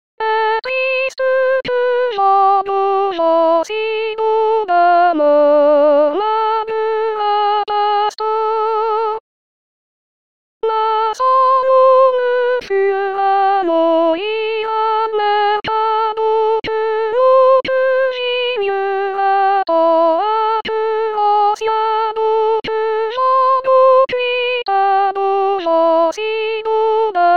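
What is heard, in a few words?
soprano; Ay triste que vengo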